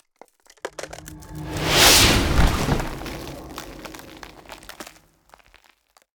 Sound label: Ice Spell Whoosh, Cold Whooshes; Arctic Aura